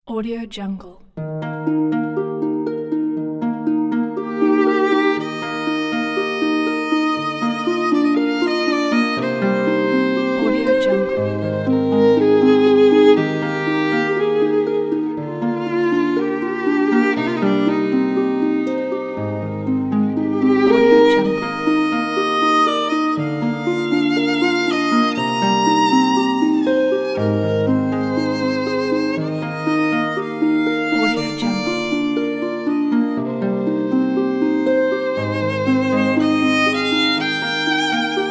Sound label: harp violins